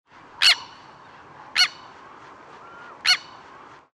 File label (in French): sterne caugek, orn